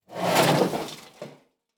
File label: Trash Can Slide On Dirt And Cement, Household